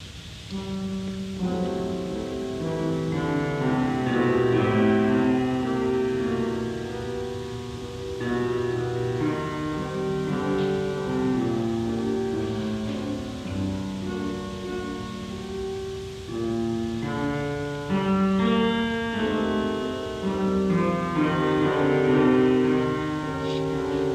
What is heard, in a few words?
Harpsichord and Piano